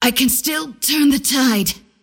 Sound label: cast pain; antimage